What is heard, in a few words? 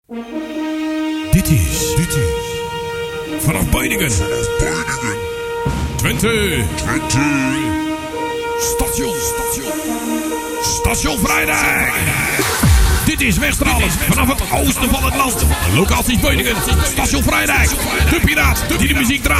Station jingle; Station Vrijdijk